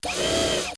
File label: jump; qbot